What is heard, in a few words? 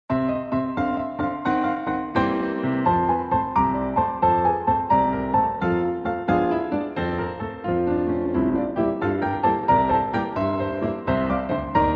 Music Only